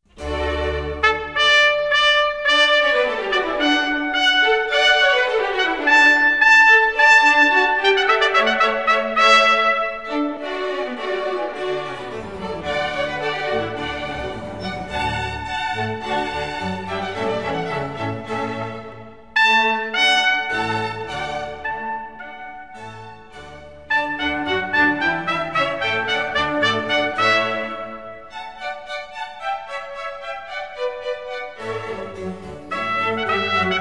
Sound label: suite for trumpet, strings & continuo in D major; spurious arrangement; Water Piece; Trumpet Concertos